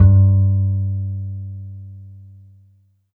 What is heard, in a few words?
BAS G2; Phat & Phunky; East West